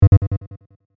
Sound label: error